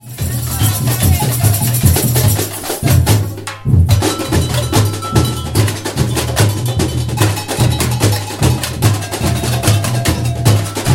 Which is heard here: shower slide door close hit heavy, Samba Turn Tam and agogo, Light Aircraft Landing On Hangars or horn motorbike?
Samba Turn Tam and agogo